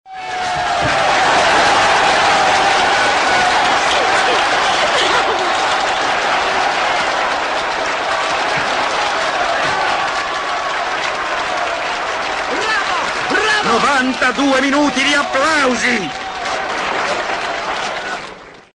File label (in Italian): applausi